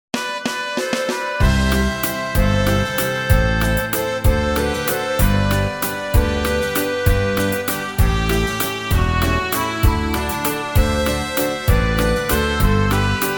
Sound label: HOR, SINGBACK, BULGARIAN